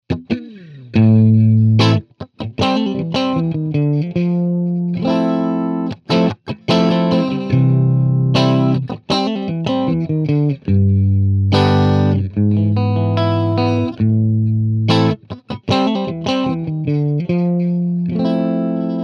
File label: Green Beret 10 - Clean; Goodsell S17; Nighthawk